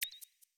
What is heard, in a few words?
song ping; osu; SongSelect